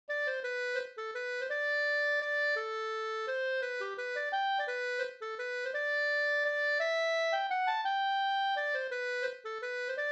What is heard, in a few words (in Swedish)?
vals; Anundsjo